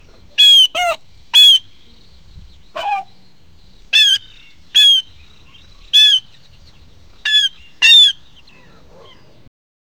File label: a lifelike pig oink recor; animal vocalization